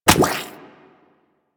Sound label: Chem Strike Nox Rifle Projectile Impact